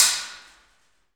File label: PRC ALMWA, Aluminum Wall; Rhythm Section; FX Perc